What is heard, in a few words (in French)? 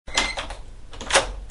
Verrous